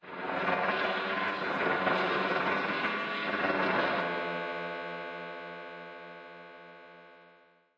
comb effects superlow; Electro and Synthetic

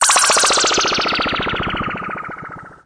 electric bird descent, Electro and Synthetic

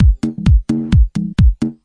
micro house, drum loops and breaks; 121 to 130 bpm

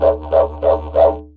didg barks, didgeridoo; world

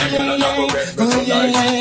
115 seven eight filtered fun vocals tinny beats; VOCAL OR VOXY LOOPS

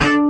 orchestra string hit D

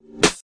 Whoop Snare, Hip Hop; drums; STYLE KITS (Hip Hop, Etc); Khezie Hip Hop Kit

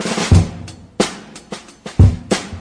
smushy all rolls, drum loops and breaks, 92 bpm; 091 to 100 bpm

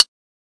Fracture Hi Hat; ModeAudio Found Percussion Hits

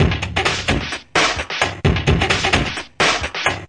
130 bpm crushed and funky, drum loop

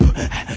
vox breathy rhythm, BeatBox Male

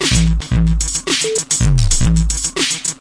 bleepy n tough; LOOPING AMBIENCE

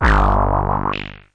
SH101 Tone; SH101 Tones; SYNTH KEYS

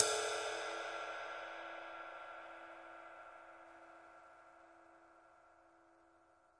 big ride; single hits; Big Believable Rides

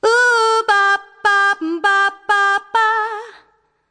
100 bpm Line; VOCAL OR VOXY LOOPS

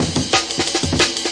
amen break, DnB Breaks, 182 bpm; 141 to 185 bpm; Ethex

